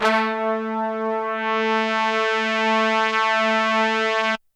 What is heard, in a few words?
HORNS A2 L